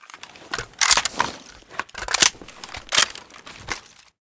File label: wa2000 reload empty, weapons